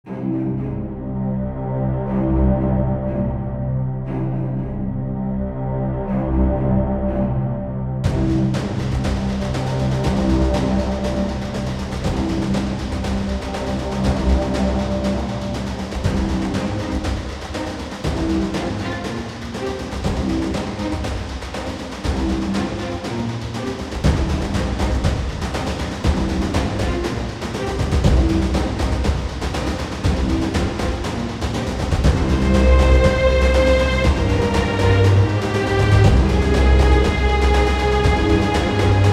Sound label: Intense